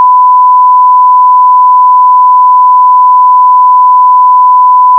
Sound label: 1kHz 4bit